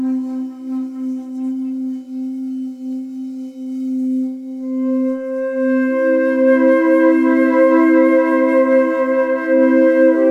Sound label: heart chakra; Chakra of Heart